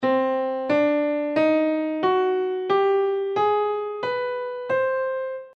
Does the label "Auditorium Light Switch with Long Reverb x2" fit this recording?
No